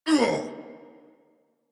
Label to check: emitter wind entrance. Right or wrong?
Wrong